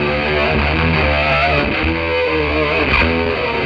Electric Guitar

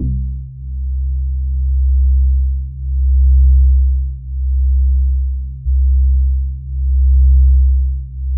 Synth Basses C2; Music Store Sound Service; Einstein

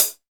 HH CL; EdgeSounds; Drum Mashines; R-8 DRUMS

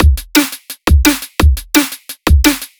drumkit

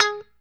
POP G#4, STRAT guitar; Spectrasonics; Hans Zimmer Guitars VOL-1; JC-STRAT POP

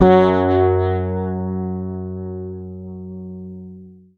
F#, Bass; Sampleheads; Will Lee Bass Library

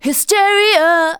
vox, Techno Trance; Ueberschall

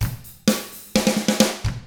127 SURF ROCK

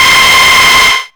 TRASH WIND, Hard & Loud Techno; USB Soundscan vol.01; AKAI

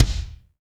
BD; REMIX 1

